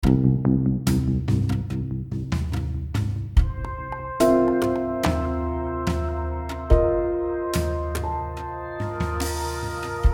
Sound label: Comedy Blues